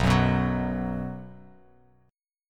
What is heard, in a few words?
B 5th B down Bass Standard; strummed; JGuitar